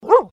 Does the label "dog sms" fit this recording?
Yes